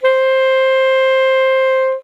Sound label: saxophone alto C octave 1